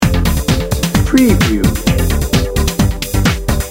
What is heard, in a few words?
technology in motion loop